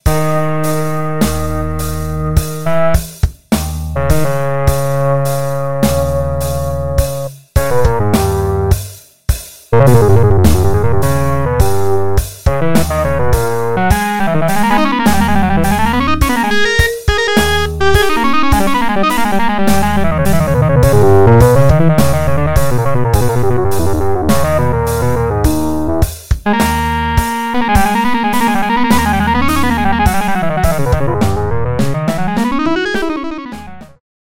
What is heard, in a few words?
lead wavy; force